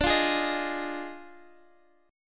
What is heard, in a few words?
Eb Major 7th Suspended 2nd Suspended 4th D